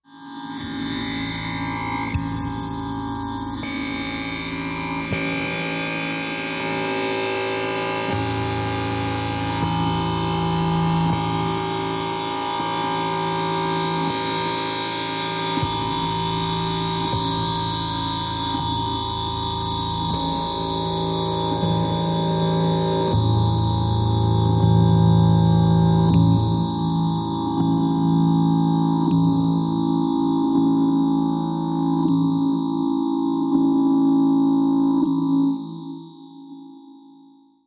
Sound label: Transfix Pulse Machin, energy beams